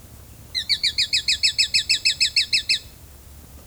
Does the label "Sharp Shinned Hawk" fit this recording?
Yes